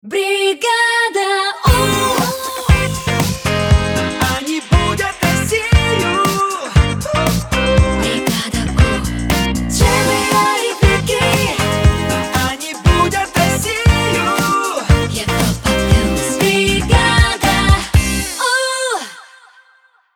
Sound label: Jingle Main ID; Europa Plus; Brigada U